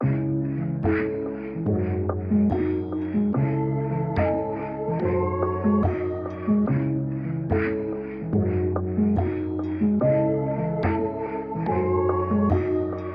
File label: dark